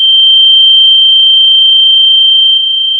Sound of a tea kettle whistling

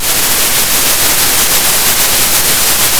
Sound of a thunderstorm

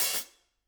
room Op Hat, drum

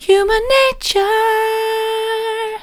human nature soft, vocal

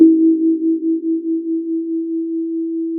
pop, Sound of a church bell

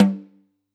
K1 close Snr Off

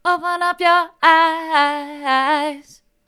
mid, vocal

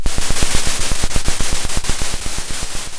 pop, Sound of a drum roll

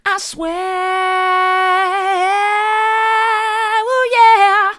i swear soft, vocal

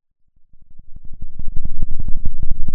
pop, Sound of a thunderstorm

